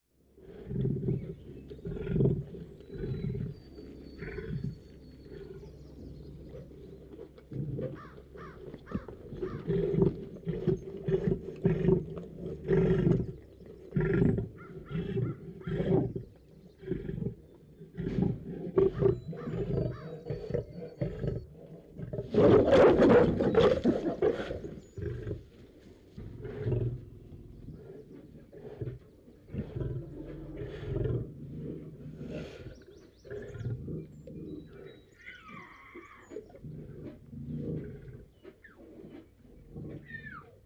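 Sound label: Lion In Cage, Roaring, Birds In Background, Animal, Cat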